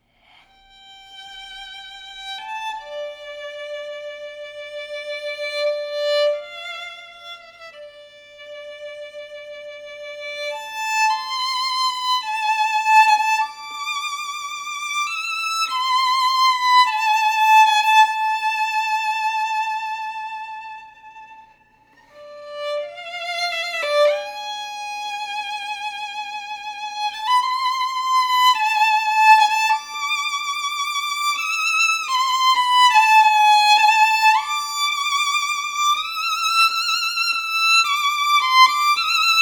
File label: Violin